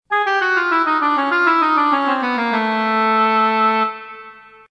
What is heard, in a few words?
failed; run